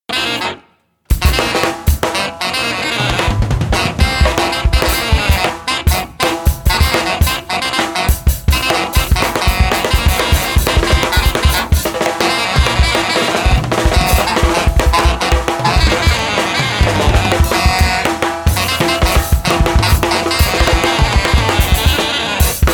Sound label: SAX Improvisation